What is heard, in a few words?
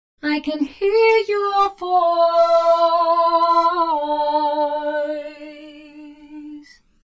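voice multiplier, vocals wet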